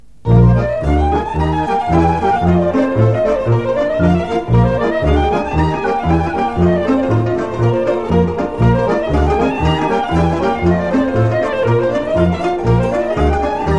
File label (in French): Roumanie; Triliseste